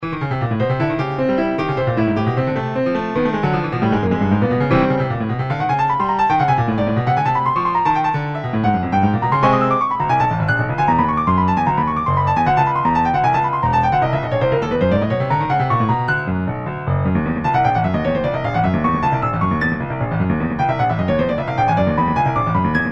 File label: Piano Prelude